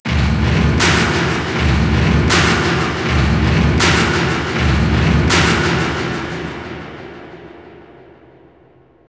Str G#

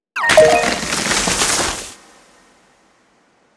Switch And Door; gamerip

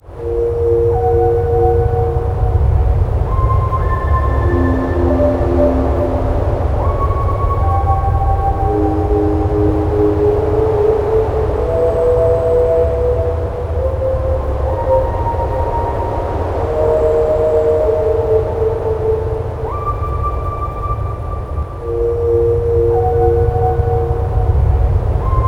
music forest intro bpm120; Donkey Kong Country Returns HD

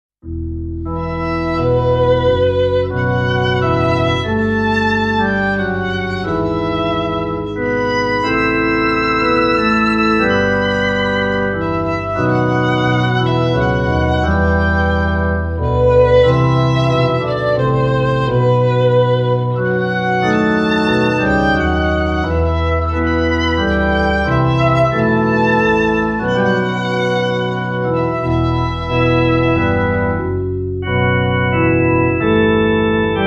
Organ E flat Minor; To A T

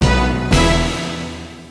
Success; bart bash

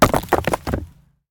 Rock Impact

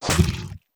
Spit

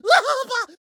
Water Splasher

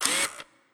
Pistol Hero Motor

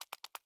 Parasite Blob Run Loop; Hollow Knight

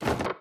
Water Splasher

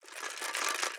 Mossman Shaker Explode Prepare

